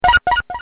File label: Notifier Light Speed; Blackberry Bold